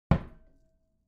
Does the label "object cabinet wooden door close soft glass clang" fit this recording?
Yes